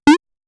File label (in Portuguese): faa um som de pulo, retro